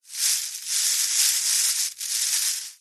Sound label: bird nest movement hay bushy; animals